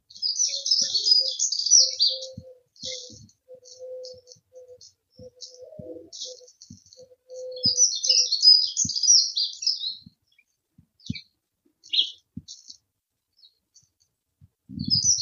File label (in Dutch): Heggemus, prunella modularis; heggenmus